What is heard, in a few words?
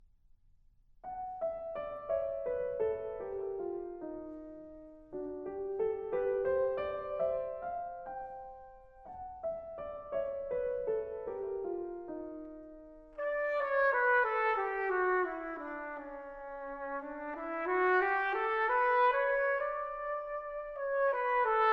Vocalise, Trumpet Vocalise